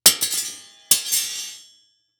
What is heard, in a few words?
Impact Metal Bars Hit Cement Multiple; Impacts